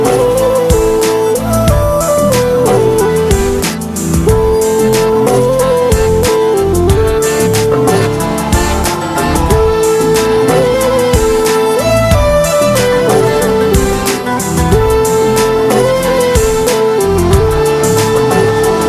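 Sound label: BG Music; PK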